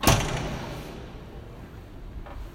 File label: Rem Door, Remai Gallery Door, Saskatoon; Remai Gallery Door, Saskatoon 2"; Infinity Room